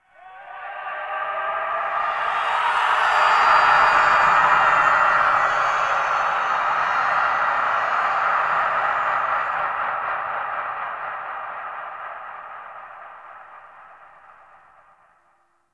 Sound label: Echoes, Ambience; Lunaro; Warframe; The New War; Zariman